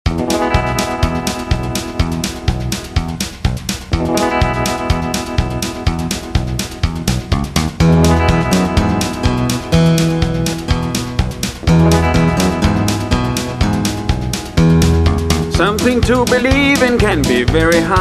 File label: country